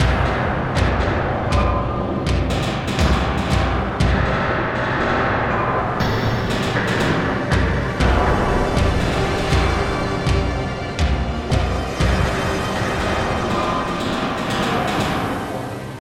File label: Danger Tonal; Insanely Twisted Shadow Planet